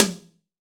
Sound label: AMB TOM H, GM DRUM SET; AKAI S-Series CD-ROM Sound Library VOL-8